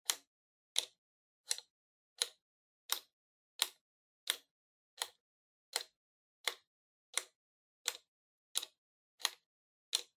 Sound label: Soroban Lower Minus